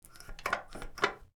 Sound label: Soap dispenser being pushed